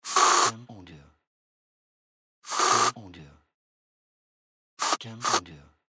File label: Spray